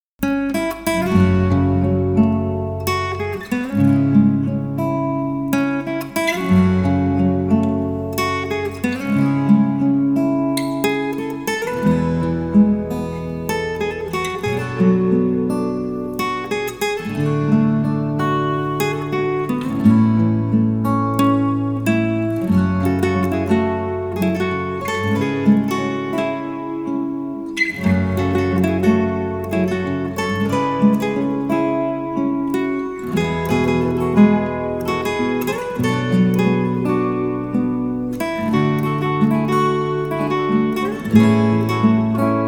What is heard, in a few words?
Guitar Play